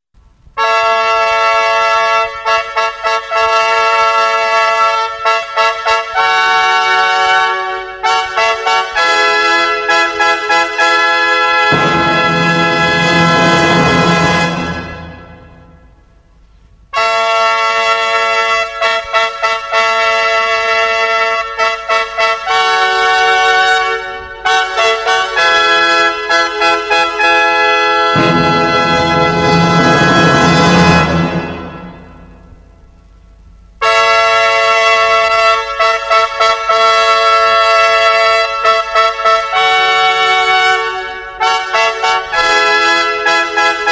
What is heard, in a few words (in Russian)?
olimpiiskiye fanfary; det-skaya-olimpiada